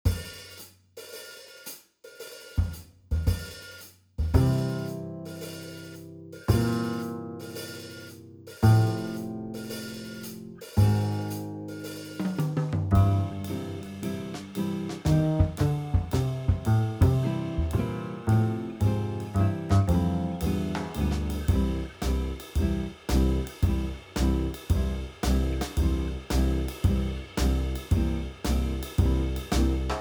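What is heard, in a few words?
Big Band Boogie Swing Backing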